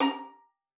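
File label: violin spiccato A#5; Xsample VOL-5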